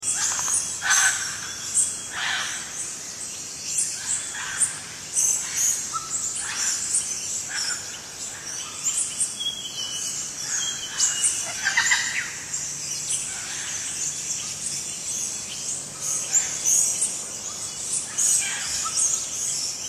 ambient rotted

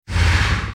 forceblast effect; abilities

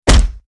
arrowstorm effect; abilities

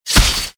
impact blade

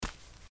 biped walk

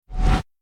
hemorrhage effect

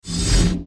assault effect; abilities

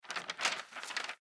map open

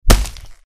impact blunt

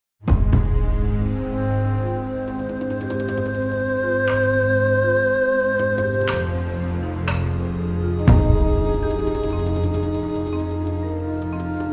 music ambient

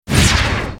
forceblast cast; abilities

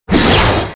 rampage cast

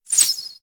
arrowstorm cast; abilities

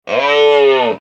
horde stag death; mobs

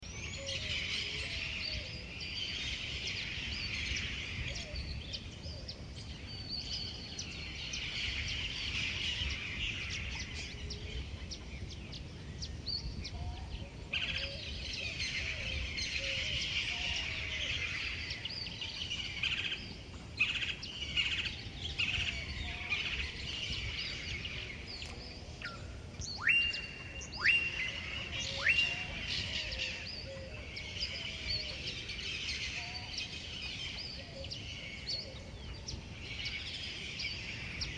ambient earthrise

